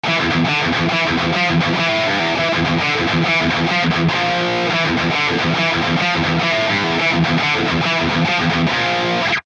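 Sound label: Bogner Uberschall; NUX MG-300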